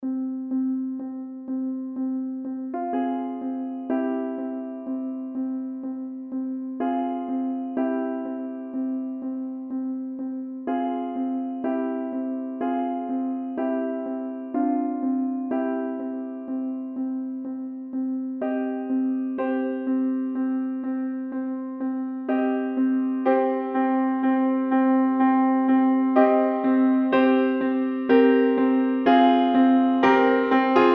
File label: piano